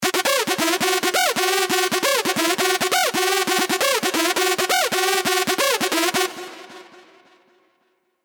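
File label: sequence, sylenth1; Fukiphino; alonso fireworks stand 1776 edition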